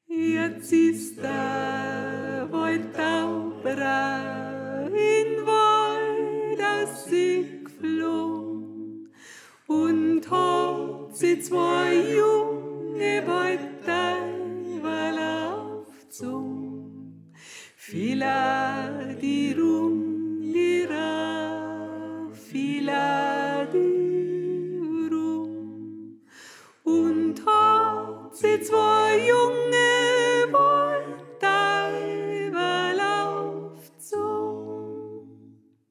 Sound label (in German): Sopran; Iatz Is Da Woidtaubra